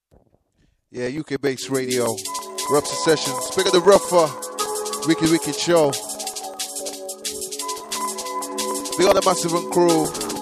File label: Drum and Bass Jungle; UK Bass Radio